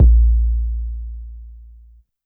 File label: BD Ana Soft Long, YAMAHA RM1X